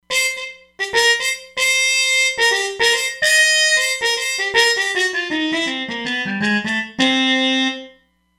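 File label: Mute Trumpet Mod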